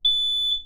Electromagnetic Shock

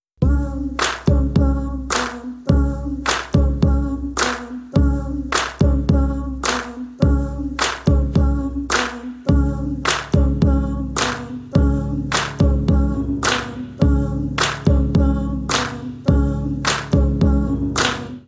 BALANCED bass

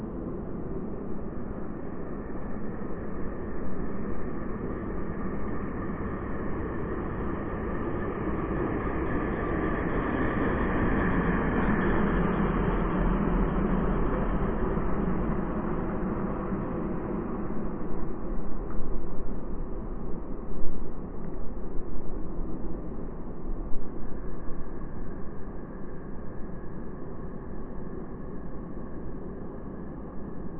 car drive by revv

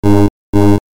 Low Oxygen

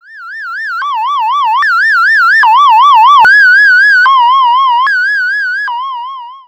doppler

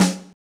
OUT SNARE

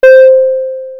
Emergency On

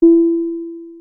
Emergency Off

